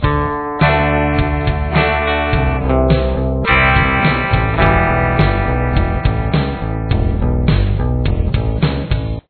solo main, guitar

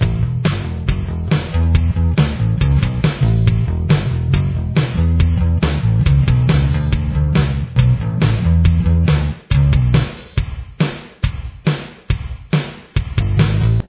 bass

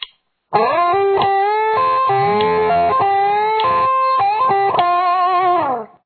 solo

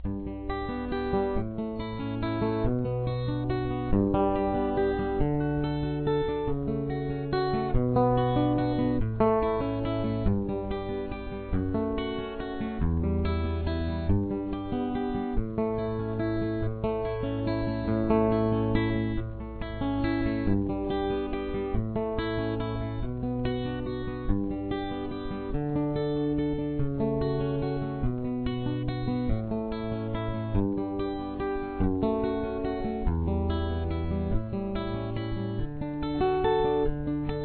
verse guitar

